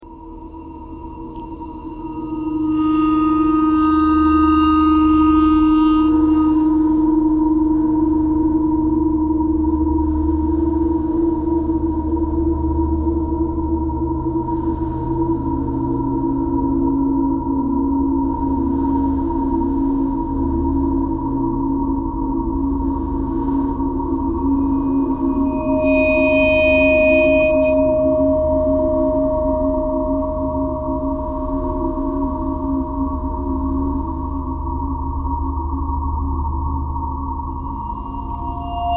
ice bowed vibes traffic k core birds thread simple; bowed metal